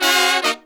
Phantom Horns, 132BPM; 90 s Sample CDs; Zero-G

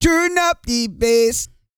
REGGAE, Vocal Planet World Voices

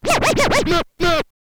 scratch kit, vinyl; Masterbits; Vinyl Attack

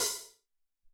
Hat Md, Alesis SR-18

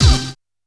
G#5 "Uh!" + Hit, Yamaha PSR-E323 Sound Effect Kit; drum machine

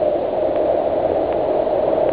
wind; wrath; Amiga Soundtracker Sample Packs

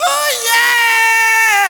SEX; Zero G; Man Machine

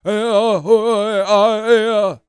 World Voices, CHOCTAW

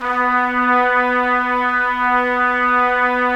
Trumpets; Roland - LA Composer vol. 4

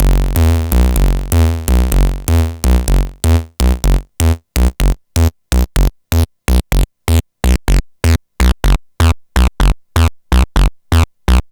Building Bass; Sonic Foundry (Sony Creative Software); Underground UK House & Electro

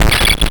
grind, EMU XL-7

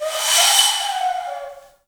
AF FLUTES, Heart of Africa

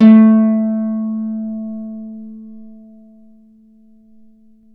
Celtic Harp, Plucked Strings

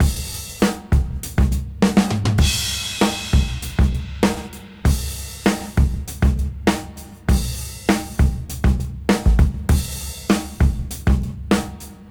Break 99 BPM; AMG; Keith LeBlanc Phat Butt Jams

